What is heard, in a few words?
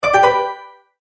multimedia tone, Goodbye